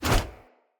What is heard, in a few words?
Corrupted Battle Dog Paw Swipe; Combat